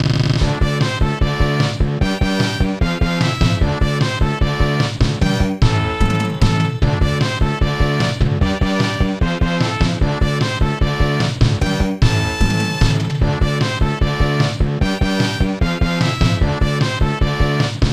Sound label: Menu Music; Untitled NFL Football Game; snes